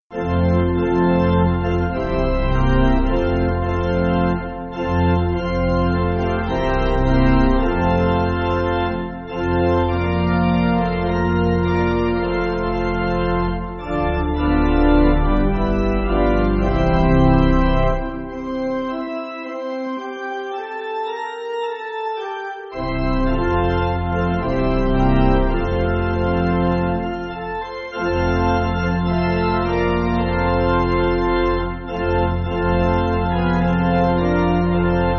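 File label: Pipe, Pipe Organ, Music Backing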